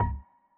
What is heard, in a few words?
reel Bounce; netent